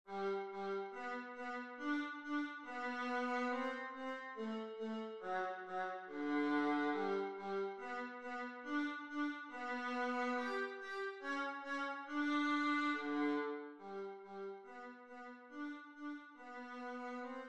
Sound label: surprise vla